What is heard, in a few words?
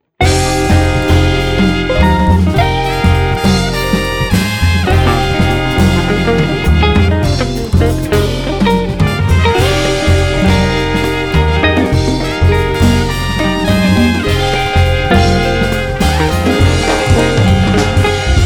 Jazz